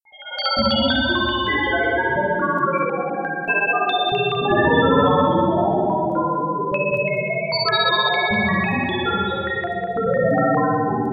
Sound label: drones melody